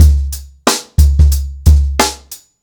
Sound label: 91 bpm drum loop sample c sharp key